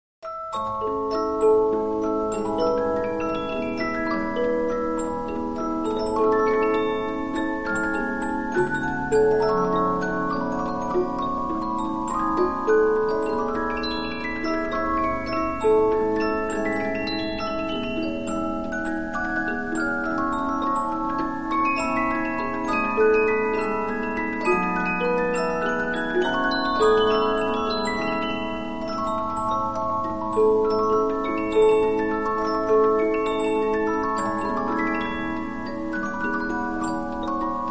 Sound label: Polyphon, 15inch disc musicbox